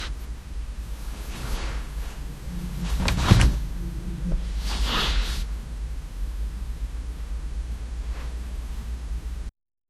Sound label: quiet bedroom ambience